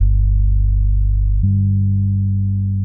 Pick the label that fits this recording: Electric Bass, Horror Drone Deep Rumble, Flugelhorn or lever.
Electric Bass